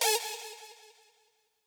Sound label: stab